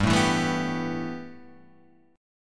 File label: A 7th Suspended 2nd, G